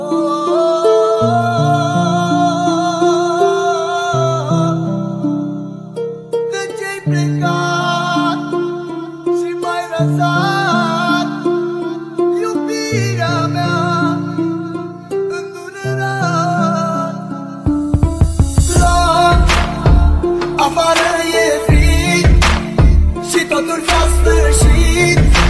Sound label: Arabic Song Ringtone; ringtones